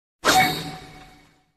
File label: Jutsu Notification; Naruto